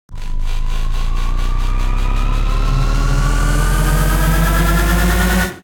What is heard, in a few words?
riser cinematic; chef audio; cinematic sound fx; sonniss gdc